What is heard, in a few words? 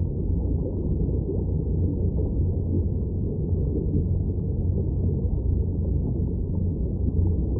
Eavesdropping Ambience Wet Room; Soundproof Walls 2.0